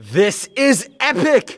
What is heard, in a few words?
win; rranger sticker